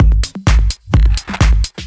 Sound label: Housebeats, 128BPM; Vengeance Samples; VEH2 Loops